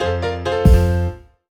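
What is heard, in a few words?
Jingle Trump Table Round Result Draw; clubhouse games 51 worldwide classics 2020 switch gamerip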